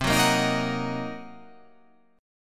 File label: C Minor 7th Flat 5th, chord